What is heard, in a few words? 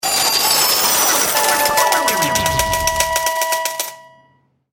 blast